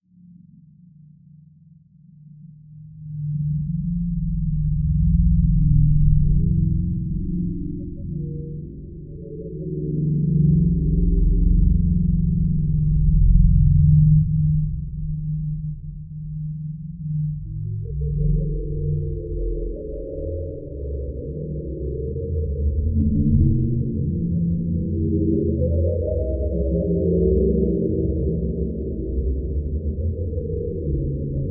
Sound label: Spooky Night Formant Drone; Haunt